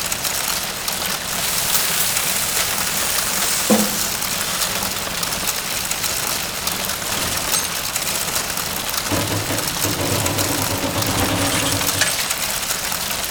ambience disaster fire

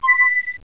alarm

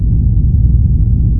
Rumbling

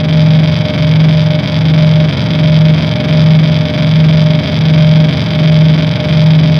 ambience electrical

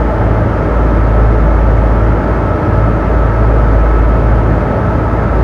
ambience motor

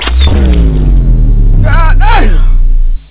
hgun penetration

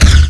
light gun fire

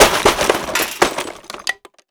small debris

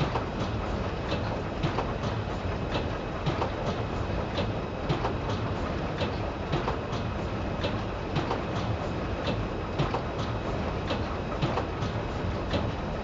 ambience industrial

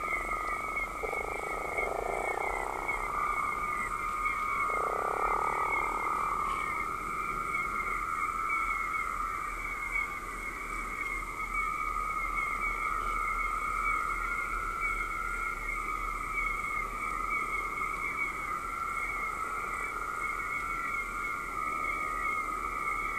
ambience

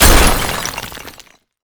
crash

